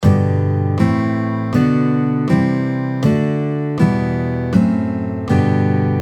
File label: G Major Chord Scale, guitar